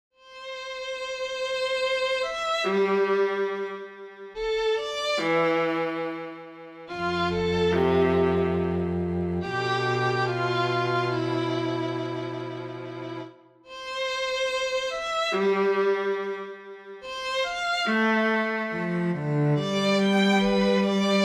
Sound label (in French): quatuor cordes extended